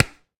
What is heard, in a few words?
Pickelball Paddle Hit Ground Hard, Ball Collision